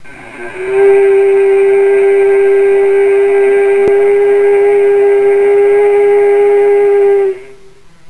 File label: MEDIUM, BELLS